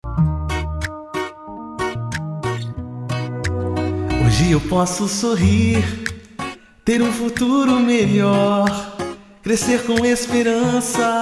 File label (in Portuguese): jingle; meu abrigo